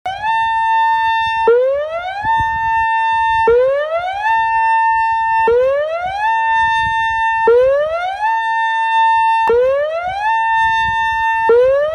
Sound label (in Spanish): Tono Inicio emergencia; Recorte Guia 2017